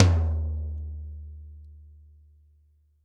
Toms, Drums; Bob Clearmountain Drums I

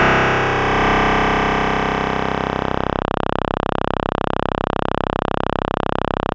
Dirt Clav C2, Fairlight CMI IIe Keyboards

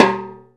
CNTR STRK, Timbale; Timbale Battery

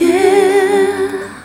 YEEAAAAH; AMG - Vince Clarke Lucky Bastard

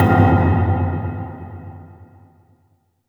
HOLLOW TUB, AMB. MALLETS; Spectrasonic Distorted Reality 1

